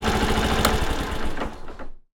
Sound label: cub idling stop; anemoi